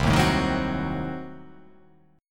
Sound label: C Diminished 7th C